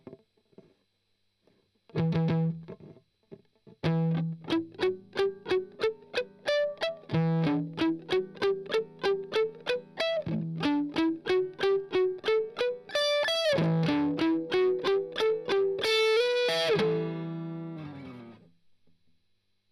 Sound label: Strum Octav Arp